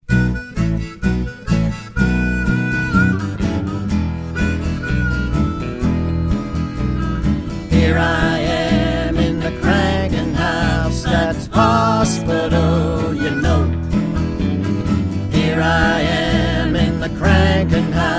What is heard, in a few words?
blues; krankenhaus